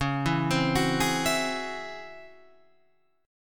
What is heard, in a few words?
Db Minor Major 11th Db down Guitar Open F; arpeggiated